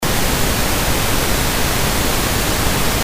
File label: pink noise; noise demo